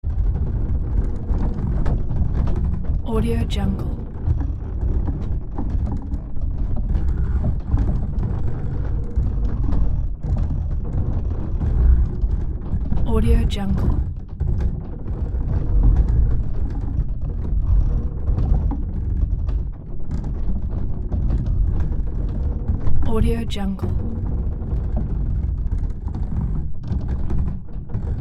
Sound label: wood tension constant drawbridge creaking; prosoundfx